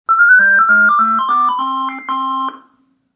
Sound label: gameover